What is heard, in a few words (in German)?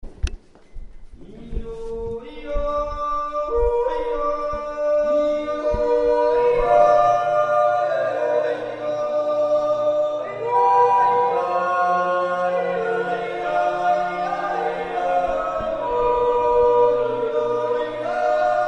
Jodel; Workshop NaturPur